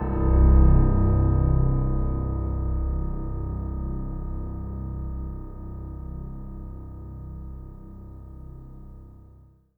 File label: C1, Synth Line; 90 s Sample CDs; NiceBeats; SynthLine VOL-23